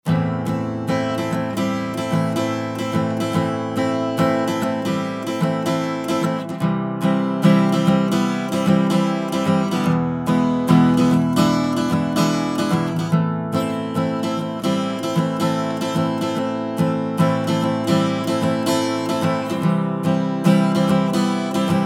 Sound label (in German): Western Gitarre Warm; Bettermaker EQ232P MKII